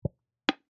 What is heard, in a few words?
Oil Can Single, Industry, Oil, Can, Single, Metal, Buckle, Antique; Hand Tools